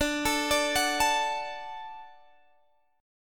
Gb Minor Sharp 5th D

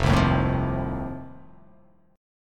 F 7th F down; F7 strummed

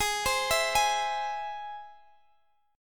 G sharp Augmented, Guitar, Drop D; x,x,18,17,17,16

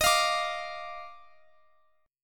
D sharp Major 7th Suspended 2nd, Guitar, Open F; D#M7sus2; strummed

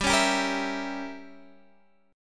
C sharp Diminished 7th G

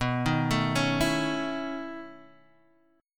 B 11th B Guitar DADGAD, chord

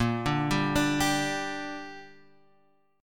Bb 6th Bb; arpeggiated; 6,5,5,7,8,x

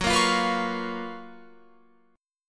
Gb Major 11th down Guitar Open F; GbM11 strummed; JGuitar

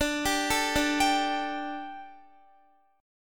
D Suspended 4th D, Guitar; Dsus4; arpeggiated; x,17,17,14,14,14

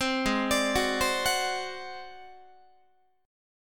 G sharp 7th Flat 5th G sharp down Guitar, chord; G#7b5; 15,16,17,16,16,17; arpeggiated

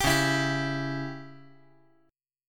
Db Diminished Db down

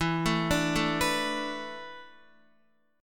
E 7th Suspended 4th, Guitar; E7sus4 arpeggiated; x,7,9,7,9,7; JGuitar

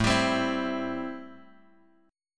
A-Minor 7th A Guitar Drop D

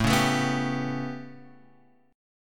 A 9th, down

